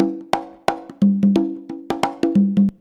CONGA, Modern Percussion Loops

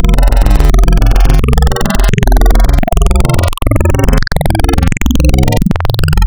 Spectral, wavetables; Architecture 32

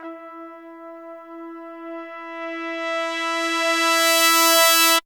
BRASS CRES; Zero-G; Phantom Horns

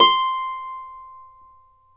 Celtic Harp, Plucked Strings; E-MU Producer Series Vol. 7 – Old World Instruments

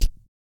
REGGAE, Vocal; ILIO; Vocal Planet; Blues & Soul

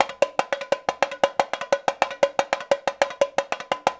RIO, Rio Batucada

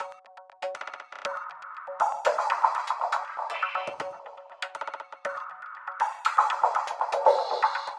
Hi-Hat Esoterica, Beats

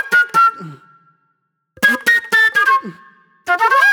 Jammy Flute; Sonic Foundry (Sony Creative Software); Manhattan Flutes; Super Brown

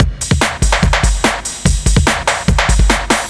drums, Hardcore Breakbeat, 145, Ebmin; Big Fish Audio; Hardcore Breakbeat Action; Riddim

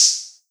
Hats, Elektron MachineDrum; SPS1 MKII

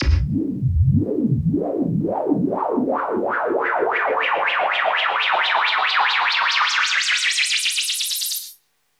Rises; neuro; Optiv & BTK

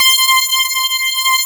METAL HARP, SYNTHESIZER; AKAI S6000 CD-ROM - Volume 1

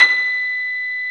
REV B5, REV PF; AKAI S-Series CD-ROM Sound Library VOL-2

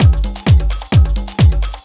drum 130 tribal, drumloops; Techno Trance Essentials

